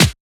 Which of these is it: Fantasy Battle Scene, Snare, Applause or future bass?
Snare